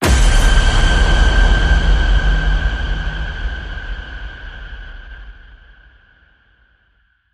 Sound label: Hit Hor Teflon Flame